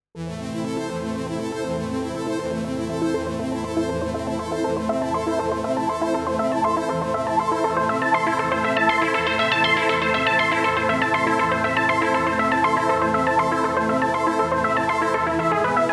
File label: Juno X Pluck Arp